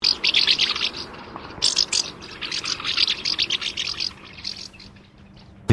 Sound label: martin, birdsong